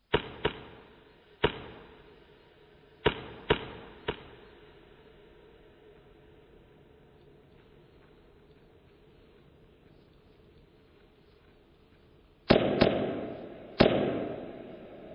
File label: clapping thread noise